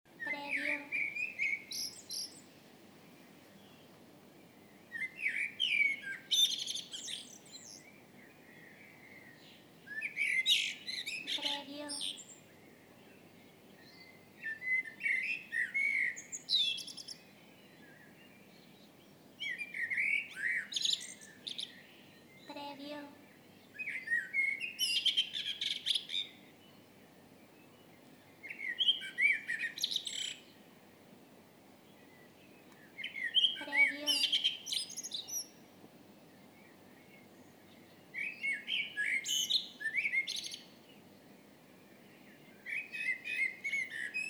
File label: Blackbird Birds sing